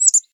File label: Chickadee